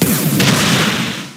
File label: Revelation Dance; PokeRogue; battle anims